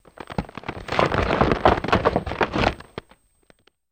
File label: Ice Groan Clunk